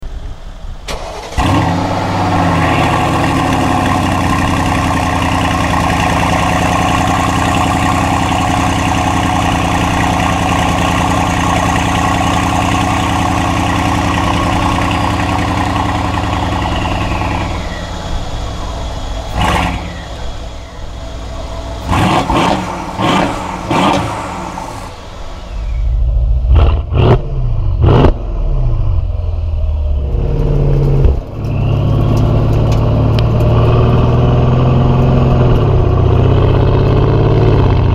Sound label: Mercedes GLE